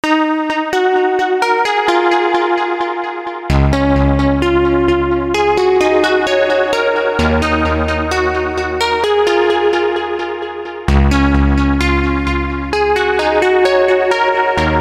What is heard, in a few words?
ARP Gitano